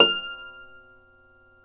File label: Celtic Harp, Plucked Strings